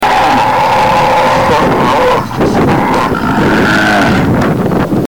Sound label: bellow, cow